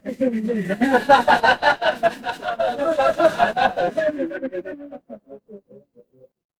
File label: crowd laughter